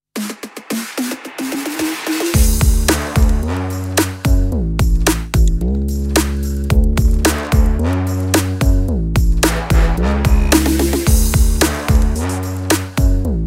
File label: Cheerful Electro C; orchestral french remixes